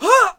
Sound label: Attack Vocals